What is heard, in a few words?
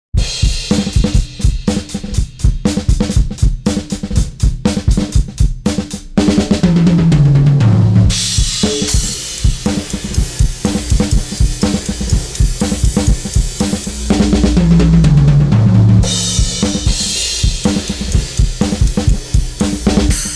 Drums; MASSIVE; Andrea Lepori